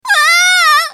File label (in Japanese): 死亡; エンジ